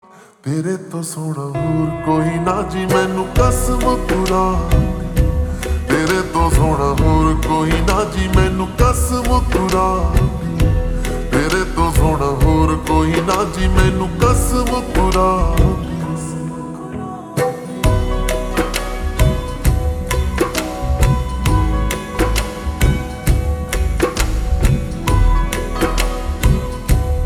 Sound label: Ringtone Slowed Reverb